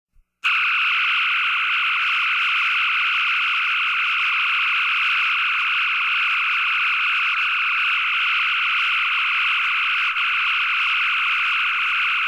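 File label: ship phaser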